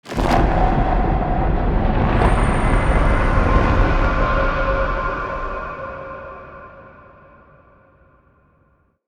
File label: Drink Potion Transition; Rednight